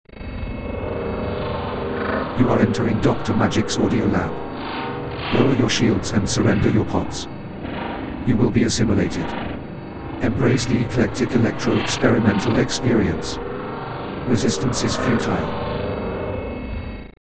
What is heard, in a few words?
Opening Jingle; Dr. Magic's Audio Lab Intro